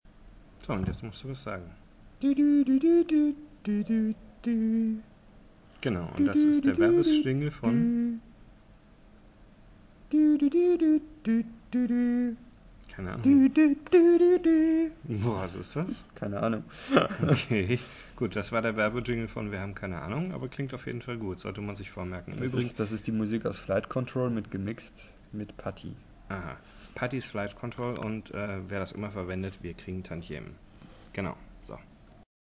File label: jingel sing